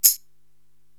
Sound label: Tambourine Push, Korg TR-Rack